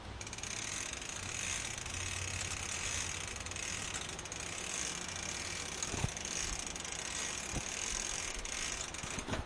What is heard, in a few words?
windup; Sound FX Real Life